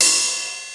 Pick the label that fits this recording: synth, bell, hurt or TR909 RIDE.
TR909 RIDE